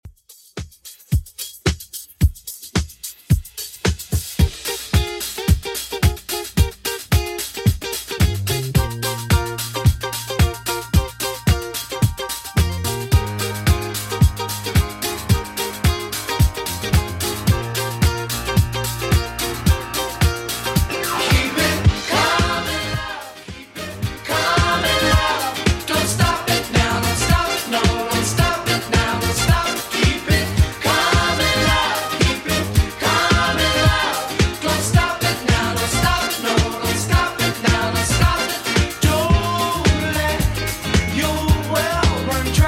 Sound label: 70s Redrum 110bpm